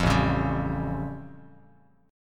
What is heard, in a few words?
A Augmented 7th A Bass Standard; strummed; JGuitar